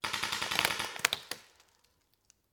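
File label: Paintball Gun Impact; Sound Ideas; Guns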